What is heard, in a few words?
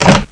Sound Door Nob; Alice - An Interactive Museum